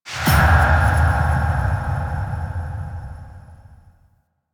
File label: Choice Menu Open; Warframe; Quests; The New War; UI; Table For Two